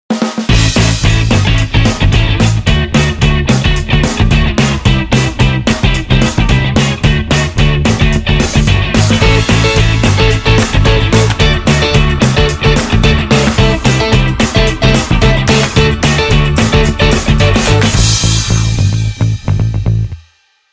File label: Pop Rock